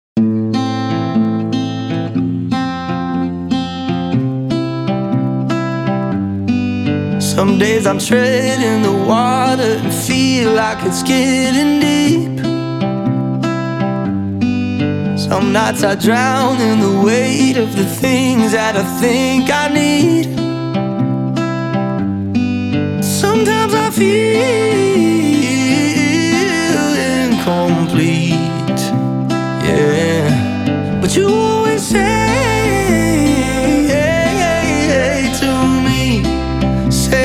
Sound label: acoustic